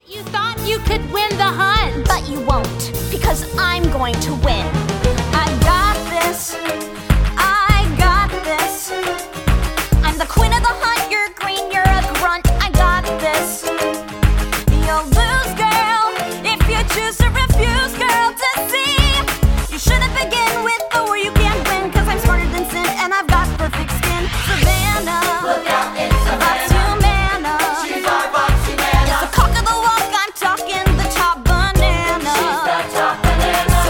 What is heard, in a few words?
Vocals